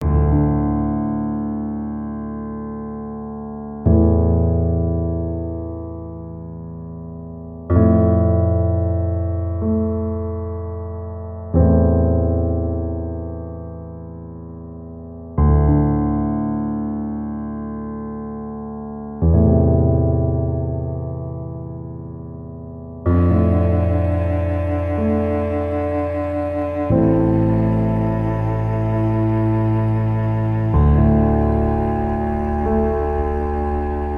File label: Calm Gentle Sad Emotive piano cello; CUES for Film TV Games etc SOLO PIANO